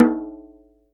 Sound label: Djembe; EMU Orbit-3